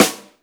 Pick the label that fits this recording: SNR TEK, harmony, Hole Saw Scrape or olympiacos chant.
SNR TEK